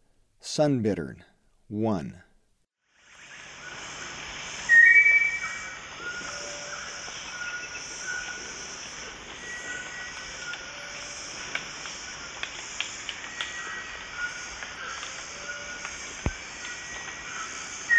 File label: Sunbittern, Birds of Ecuador; S. America